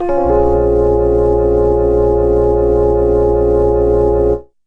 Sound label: rhodes stagger chord; Pads